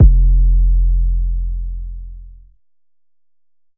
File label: 808 F; SONNY D